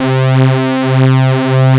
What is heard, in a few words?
OBERHEIM C3; Best Service Dream Experience